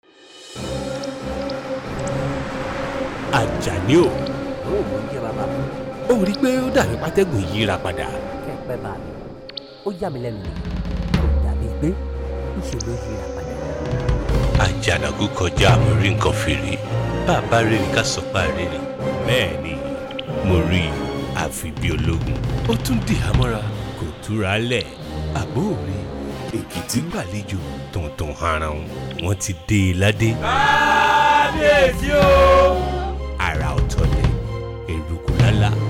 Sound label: Jingles